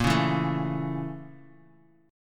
A sharp Minor Major 7th Flat 5th

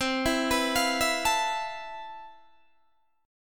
C Major 13th C, Guitar DADGAD

